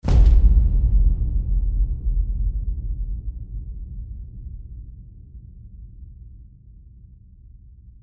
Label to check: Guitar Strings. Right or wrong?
Wrong